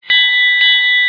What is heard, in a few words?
alarm information; zabbix